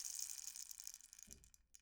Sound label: Rainstick, Household, IR Samples; Convolution; NI Kontakt 5